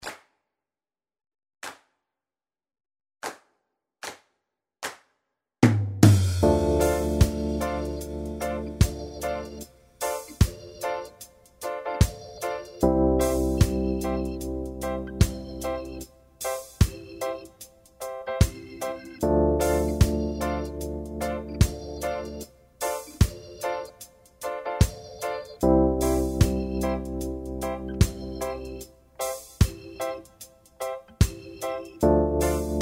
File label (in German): Dreiklänge Reggae